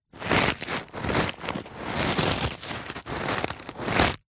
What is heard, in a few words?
stress ball rubber squeezes; foley; zapsplat